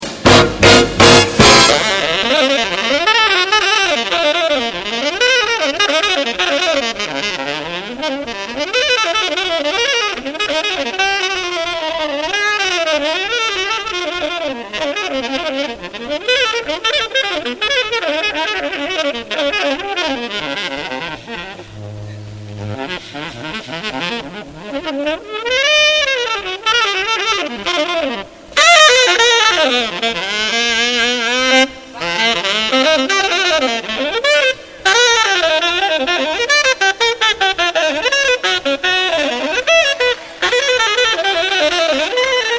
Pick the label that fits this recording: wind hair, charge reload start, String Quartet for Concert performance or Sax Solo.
Sax Solo